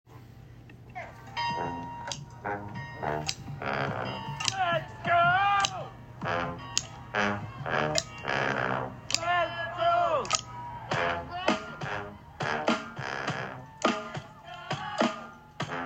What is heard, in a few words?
tuba rap